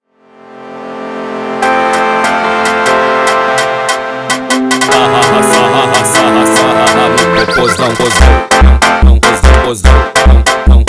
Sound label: Funk sped Up